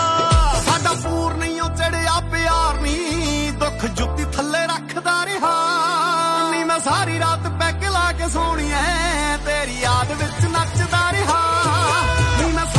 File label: Ringtone